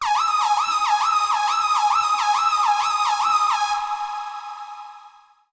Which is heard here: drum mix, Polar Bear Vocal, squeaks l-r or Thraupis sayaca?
squeaks l-r